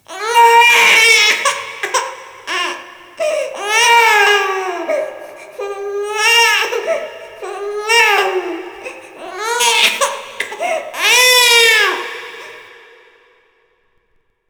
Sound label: baby cry; Shin Megami Tensei Imagine